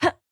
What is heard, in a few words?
Attack Normal; Jackie